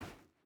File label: footstep rubber boots sand walk; Rust